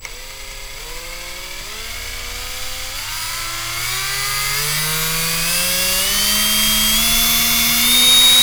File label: runup bosch driller